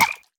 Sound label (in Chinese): 青蛙受伤声